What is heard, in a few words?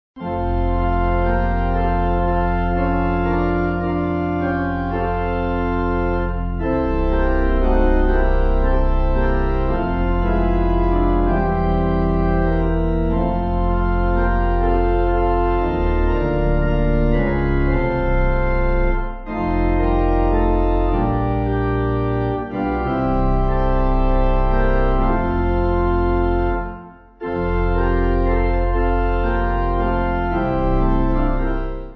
Pipe